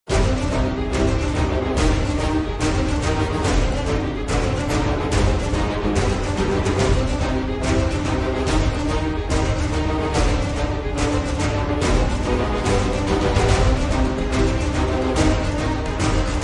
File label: Without Choir; Fired Earth Music